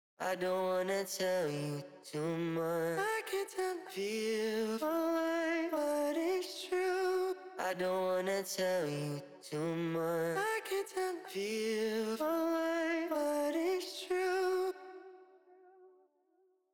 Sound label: Sad Melody Loop - 130 BPM B Min Vocals; Melodies; Loop Stems; Cymatics; Oracle Sample Pack